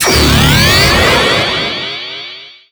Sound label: tele activate